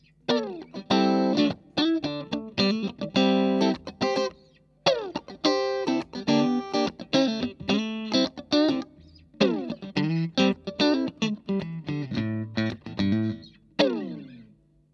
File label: stratocaster; fender new standard guitars